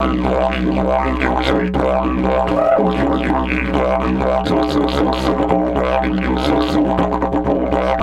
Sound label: 120, Didgeridoo